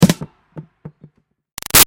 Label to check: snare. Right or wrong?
Wrong